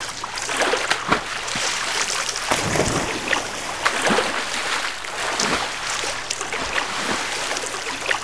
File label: Splashes Only; ambience; pet rock